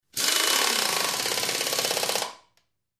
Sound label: Grinding Air Wrench, air tool